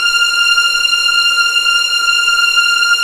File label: F6 LEG F, VIOLINS; East West; The Ultimate String Collection